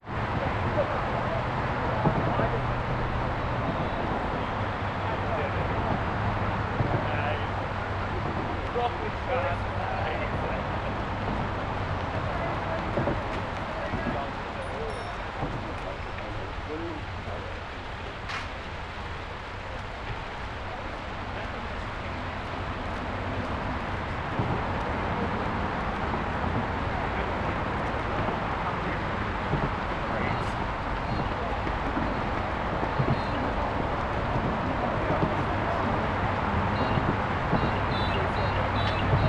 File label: bridge, Dight's Falls; Dight's Falls Tour 2026